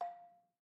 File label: Ethnic Xylo soft mallet F4, Balafon; Idiophones; Struck Idiophones